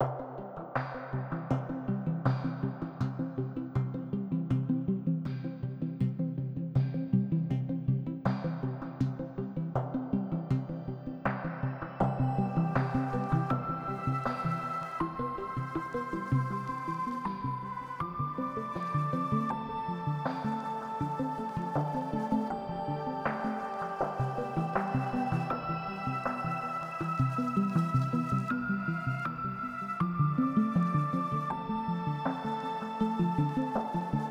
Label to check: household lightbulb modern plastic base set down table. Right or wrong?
Wrong